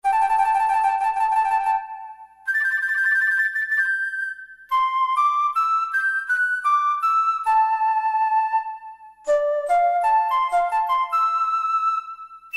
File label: Verb Flute, E-mu Proteus-1; Presets